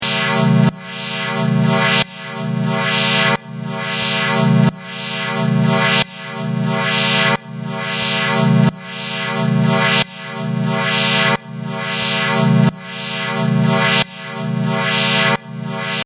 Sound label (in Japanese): fm, FM系音色1 (osc1はキャリア、osc2がモジュレーター); 魁Reaktor道場